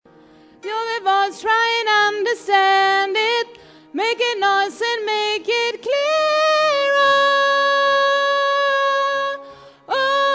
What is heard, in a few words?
tenor